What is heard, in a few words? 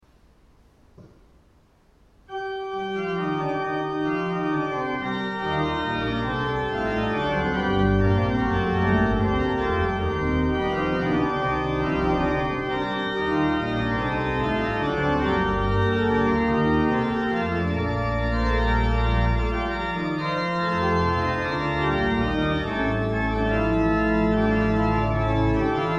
Wir Christenleut organ; Orgelbuechlein